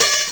HH, HIHAT HIP, Dance Mega Drums

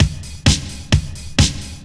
drum 130 straight; Techno Trance Essentials

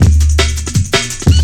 165, Drum Loops, Drum & Bass; Total Drum&Bass

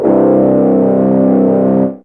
Fuzz Gtr C3, Fairlight CMI IIe, Guitars

